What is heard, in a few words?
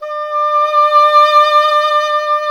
E. Horn